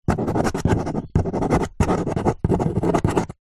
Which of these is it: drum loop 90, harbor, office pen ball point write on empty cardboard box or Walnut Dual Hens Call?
office pen ball point write on empty cardboard box